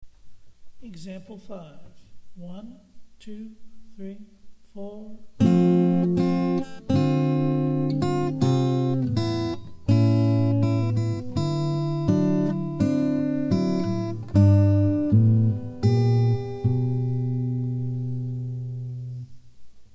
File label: JINGLE